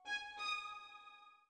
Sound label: orbit violin; Sky Children of the Light gamerip